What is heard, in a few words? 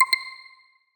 Success Notification